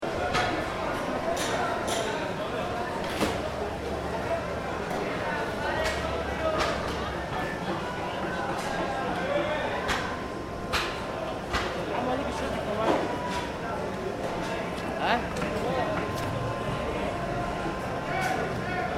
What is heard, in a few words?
Food Market Crowd Athens Greece, Heavy crowd, some shouting; This sound could also be used as a demonstration crowd; City Sound Effects; Lynne Music